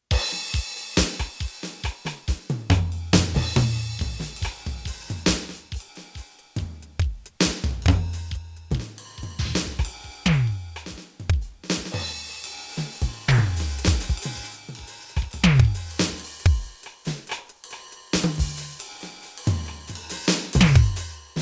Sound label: Drum Jam